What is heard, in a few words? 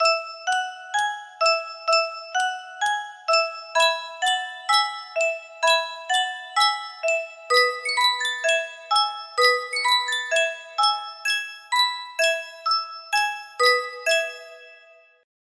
Sankyo boite a musique, music box melody